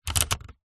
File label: vehicles car fan speed dial turn clicks toyota rav4